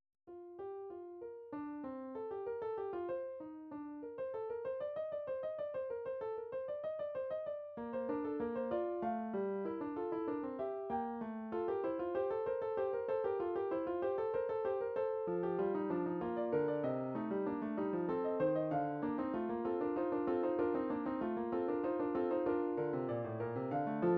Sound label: classical fugue, midi